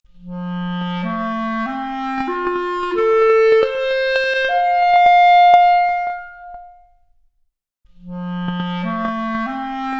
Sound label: Clarinet arp, Stereo output noised; 4bit